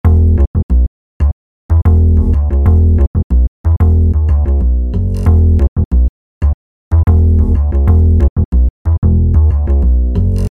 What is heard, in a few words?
bass